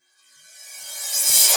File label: FX Uplifter